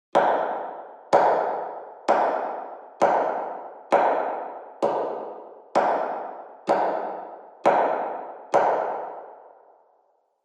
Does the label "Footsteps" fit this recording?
Yes